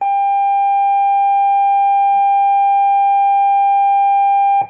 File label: Tone